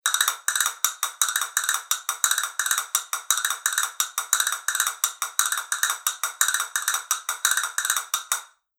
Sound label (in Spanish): fibra negra, castañuelas